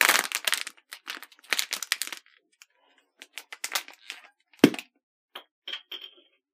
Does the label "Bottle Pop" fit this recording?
Yes